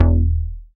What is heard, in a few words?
BAS MKS-50, Roland